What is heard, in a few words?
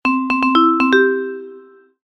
call in; odoo